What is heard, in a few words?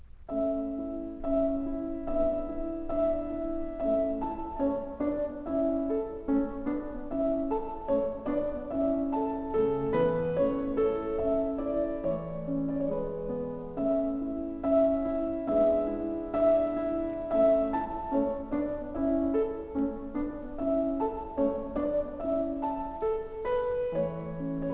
mozart piano